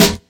crisp snare sound f key, Royality free snare sample tuned to the F note. Loudest frequency: 2979Hz; snare drum samples